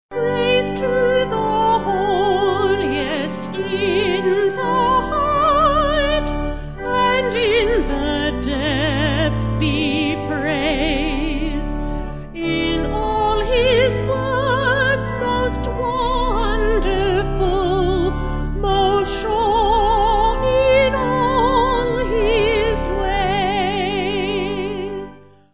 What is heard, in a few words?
Vocal Organ